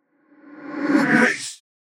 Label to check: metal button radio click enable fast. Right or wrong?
Wrong